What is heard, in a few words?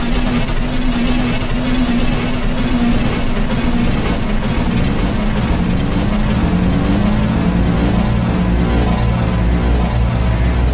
warbling